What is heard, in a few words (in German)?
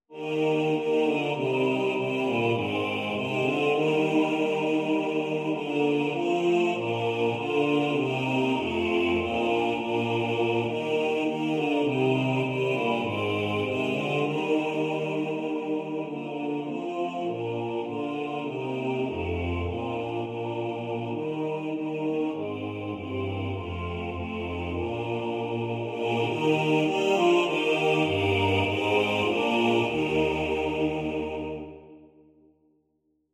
Bass; Jesus Christus Gottes Sohn; Satz Karl Wezel; Bass 2 im Vordergrund